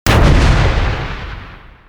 Remote Mines explode

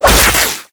attack; Razor

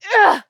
Mage Grunt Physical; Realm Royale; Technomancer

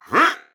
Grunt Attack; paladins; DarkLord

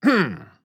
Grunt Attack

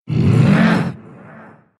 exert, ru; Dota 2

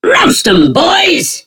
attack; Awesomenauts; Commander Rocket

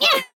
Grunt Attack; Maeve

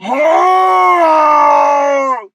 Falling; Paladins; DarkLord

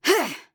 Grunt Slow; Realm Royale; Hunter

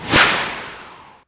projectile launch; Queen of Pain

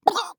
Death; Warrior; Realm Royale

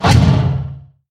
pulse target; Void Spirit

